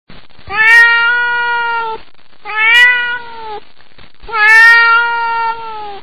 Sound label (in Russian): zvuk koty cat